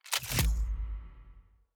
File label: big win